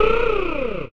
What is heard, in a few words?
zombie death